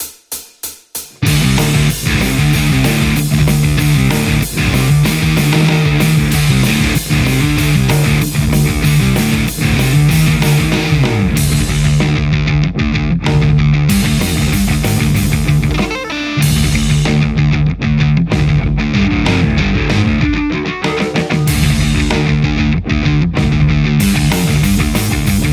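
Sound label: metal Stratocaster; igniteamps theanvil v2 01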